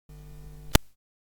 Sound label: Electric guitar unplugging jack